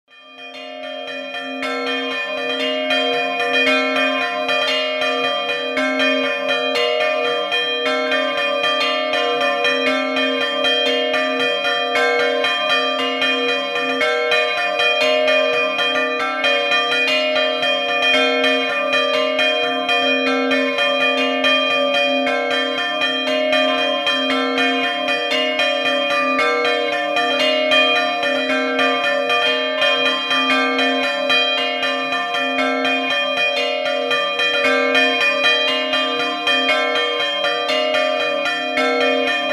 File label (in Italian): scampanio clavais, campane